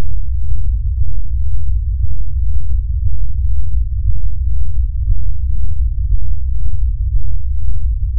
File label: 250Hz